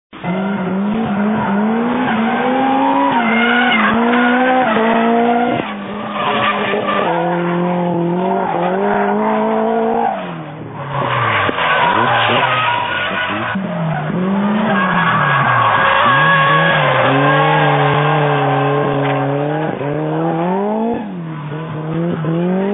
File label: drifting 240sx drift drifting japanese japan cars